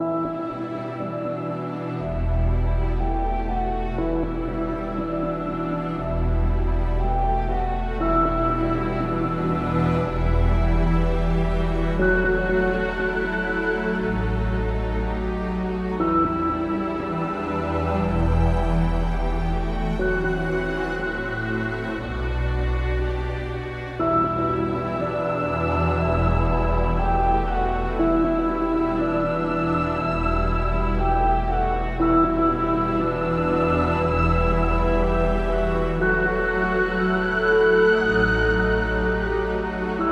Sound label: Tragic Ambient